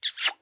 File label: kiss; soundscrate